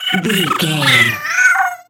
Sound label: goblin fairy death intense